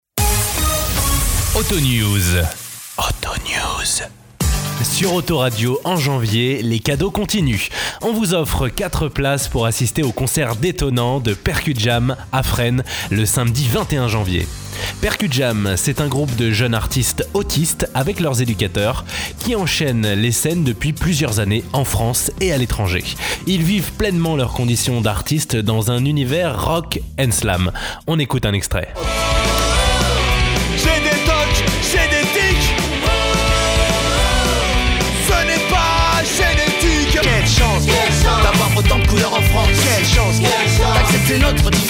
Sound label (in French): PercuJam; PercuJam à Fresnes